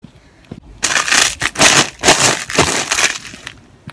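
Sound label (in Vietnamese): tiếng chà đạp giẫm chân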